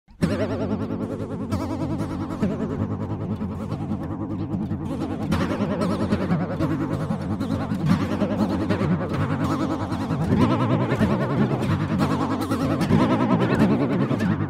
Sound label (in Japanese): モジュレーター サイン